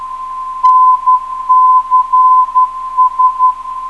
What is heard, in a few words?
NCS 409kHz